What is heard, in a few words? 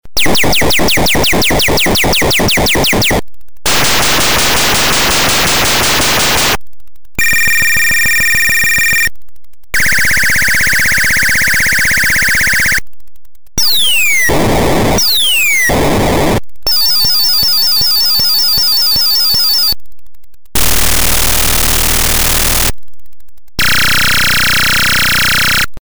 lr sound effects unmod